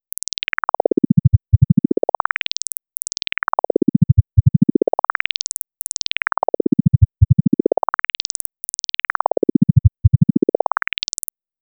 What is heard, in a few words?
12.8k-100Hz 4 cycle sweep spaced 50ms 8x